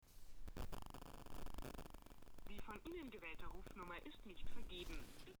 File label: telephone; Octava 102